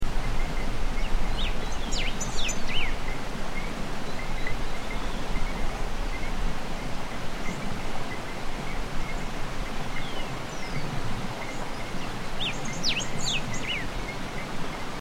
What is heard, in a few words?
golden-billed saltator